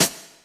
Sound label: BRSH OPEN, REGGAE; Pro-Rec - Synthsonic; REGGAE+ETHNC